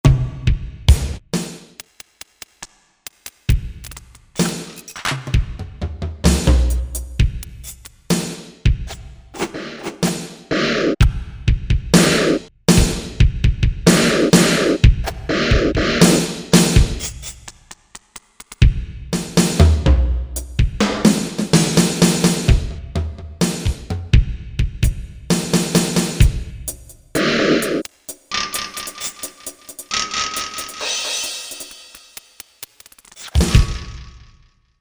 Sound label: SE & Drums; Korg MSC-14S Sound Effects